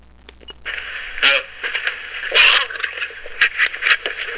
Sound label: growl